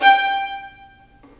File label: STR VN3, Vlns Marc; L-CD702; Vlns Marc1-3